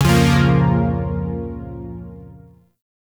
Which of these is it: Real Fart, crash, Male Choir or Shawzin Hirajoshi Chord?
Shawzin Hirajoshi Chord